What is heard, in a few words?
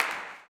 CLAP, Blues Soul; Spectrasonics Vocal Planet